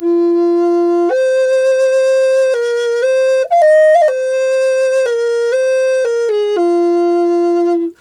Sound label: F 120, FLU RIFFS, Didgeridoo and Other Primitive Instruments; Big Fish Audio - Didgeridoo and Other Primitive Instruments